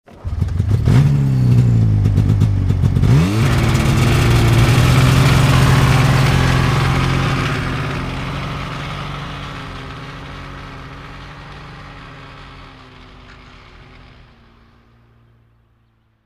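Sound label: Snowmobile 550cc Engine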